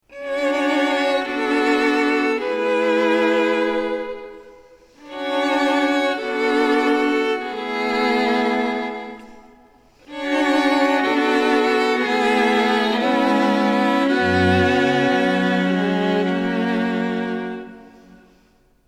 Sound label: Violas Reverie